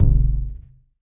boomer bass, Kicks; single hits

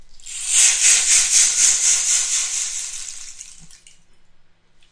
rainstick stick shaked; ETHNIC and WORLD PERCUSSION

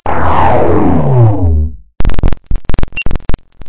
emp blast computer fart; Electro and Synthetic

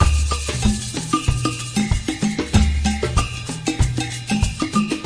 95 latin agogo fun time; 091 to 100 bpm

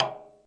miyadaiko C1; ETHNIC and WORLD PERCUSSION

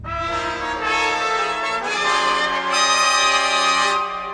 complicated horn crusade; HORNS SAX TRUMPET BRASS

